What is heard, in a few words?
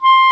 WND CLAR M, Clarinet; Roland L-CDX-03 Disk 1; Clarinets